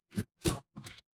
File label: ui modal